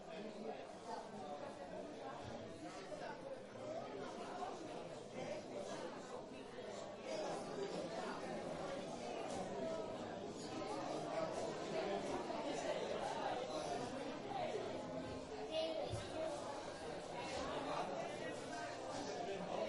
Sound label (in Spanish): zumbido